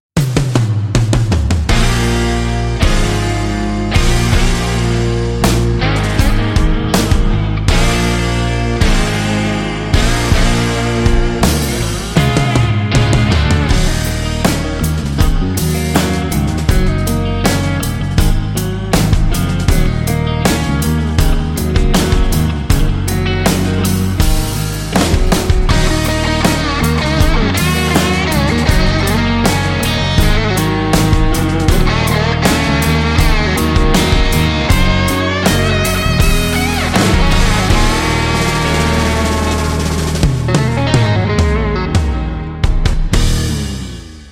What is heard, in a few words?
Ident Blues Logo; blues intros